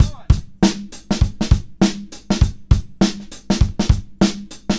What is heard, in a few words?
Live Beats, R&B Drums